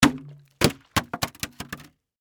Soda Bottle Drop, Foley, Soda, Bottle, Drop, Bounce, Full; Drops; Objects; BlastWave